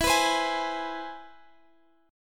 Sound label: C Augmented Major 7th E